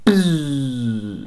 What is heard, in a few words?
shield break; protectTheRoom